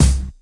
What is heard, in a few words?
Bouncy Bubble Bounce; subway surfers city sound effects